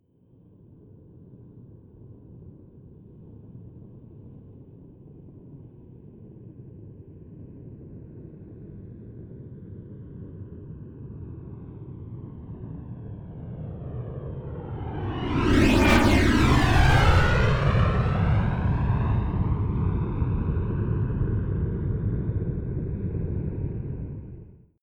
military jet a-10 thunderbolt flyby left to right close